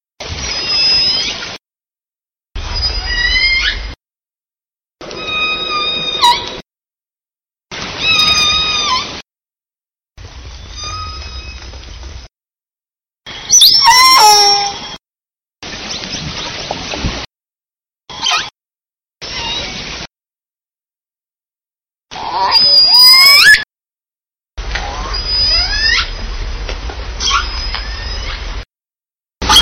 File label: Call Samples, orca; L54s L84 L88